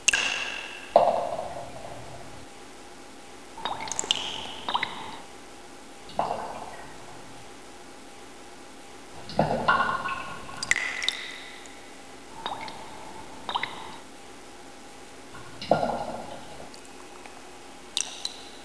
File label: cave drips; borg